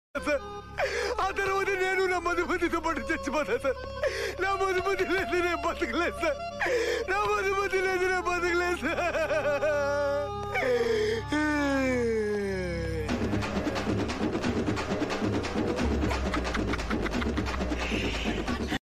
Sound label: crying and smiling; allu arjun; audio meme